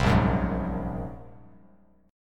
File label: A sharp 7th Suspended 2nd Sharp 5th, Bass Standard; A#7sus2#5 strummed; JGuitar